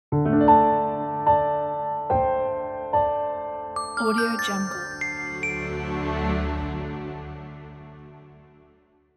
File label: laconic logo